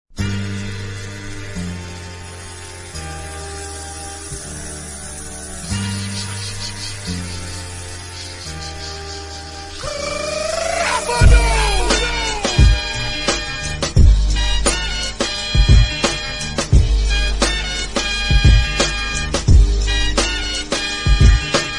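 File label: background music Ringtone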